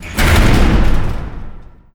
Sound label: Lift Large Elevator Door Open; Doors